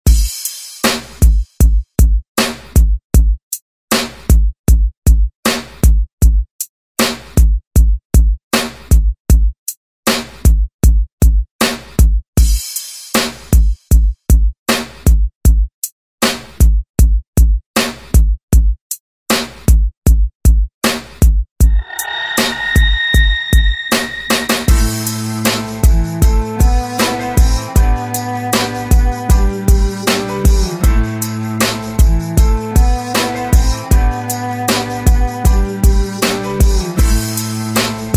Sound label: Rock Redrum; Deejay Hit